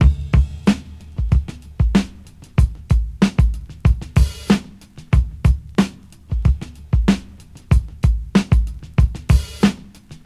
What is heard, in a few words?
94 bpm drum loop sample c sharp key